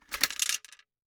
Gumball machine, metal, glass, candy, switch, mechanism, rotate, dropping, wide; Eiravaein Works; Vaeyan IV